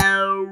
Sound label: OWW BASS, JV 1080; Pro-Rec - Synthsonic